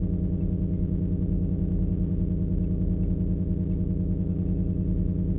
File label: comm room ambience; Star Wars Obi Wan; XBOX